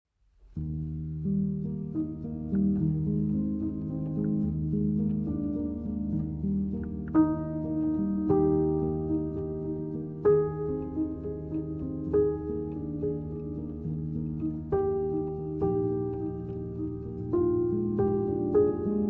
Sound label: Ave María, Upright Piano; Kaldalóns; Arr. Víkingur Ólafsson